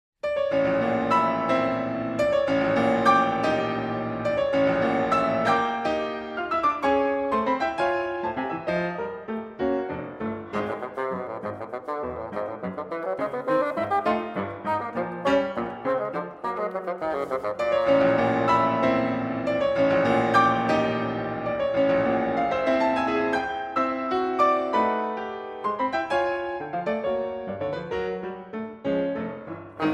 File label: Sonata For Bassoon & Piano, Allegro energetico